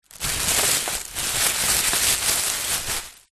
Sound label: foley plastic carrier bag movement